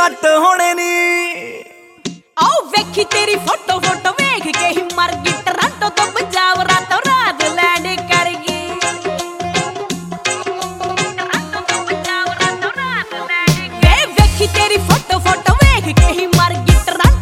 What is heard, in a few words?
Ringtone, Punjabi